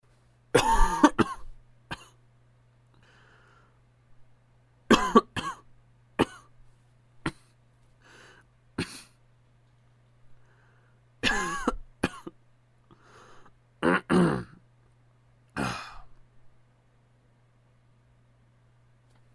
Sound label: Real Cough